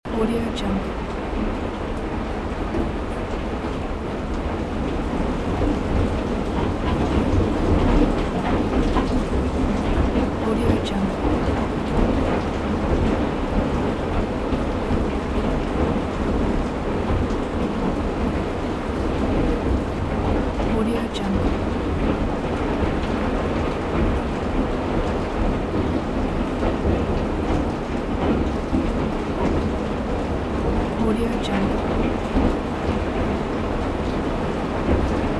very heavy rain behind window glass loop; باران خیلی شدید پشت شی; nature sounds

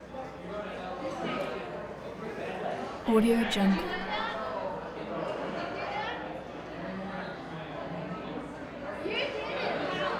crowds wedding crowd wedding upbeat constant; آمبیانس صحبت و همهمه; human sounds

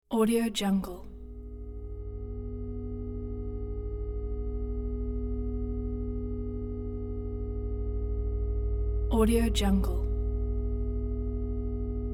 technology space atmosphere; futuristic

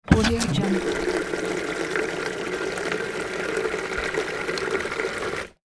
plastic can quick filling with water; domestic sounds

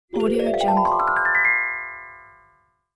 magic ascending ring bells; game

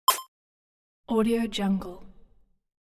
lo-fi simple select tone; interface sounds

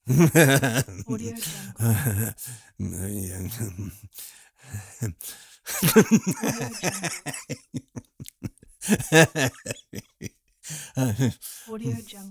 crazy man mumbling laughing

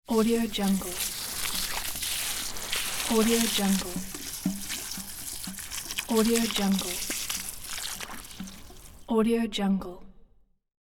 watering plants with plastic can; domestic sounds

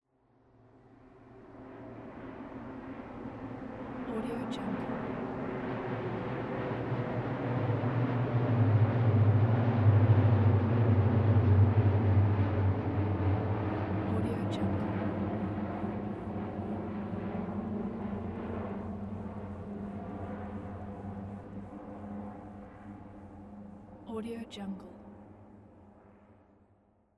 six military propeller planes flying by

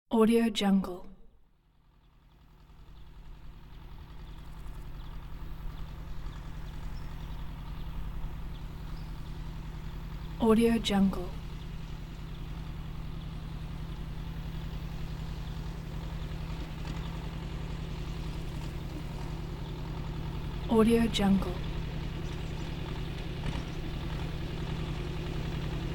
tractor long approaching and turn off; industrial sounds

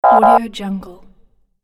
wrong error effect; interface sounds

